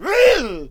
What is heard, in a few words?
Cannon Attack Vocals